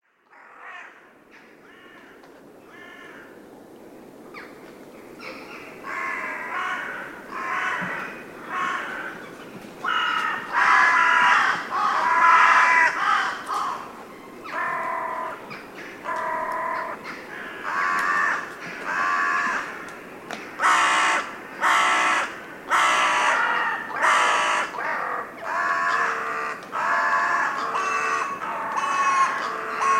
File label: crows rooks and jackdaws waking up